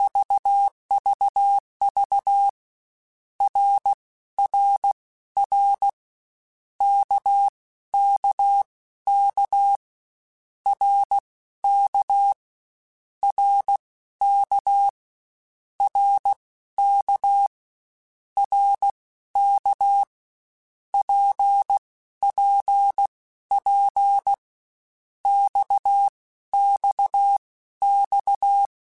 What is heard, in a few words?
CW Code Groups Mixed Short Runs Balanced; Morse Code; HamRadio